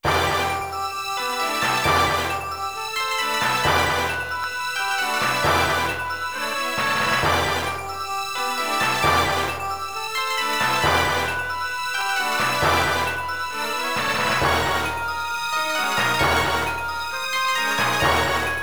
BGM Forest, Cave; BGM4 ~Forest, Cave~

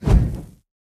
Fire Ignite; Going Under

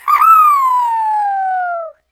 Creature; Orphaned

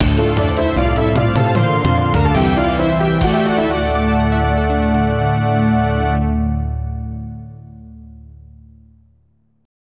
Stage Completed (LA)

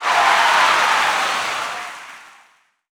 Crowd

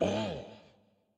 Hurt; Going Under; Dinkubus

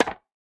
Racket Weapon

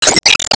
Ball Hit Drive; disney star smash android ios mobile gamerip 2020

